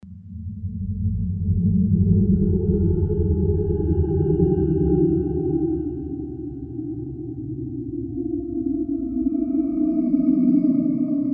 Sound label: Wind, Korg MSC-07S Synth